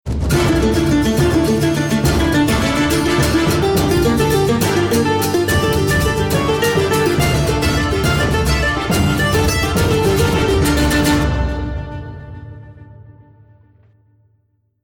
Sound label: start action